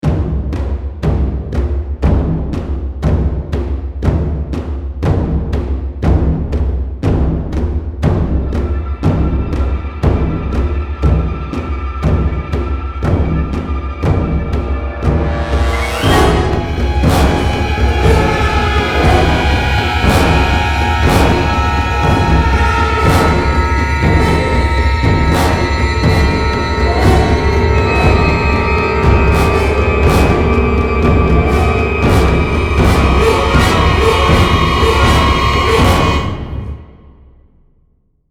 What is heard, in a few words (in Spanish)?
Atmosfera Terror